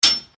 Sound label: Chain Impact Or Anvil Impact; Chain Sounds; Metal Sound Effects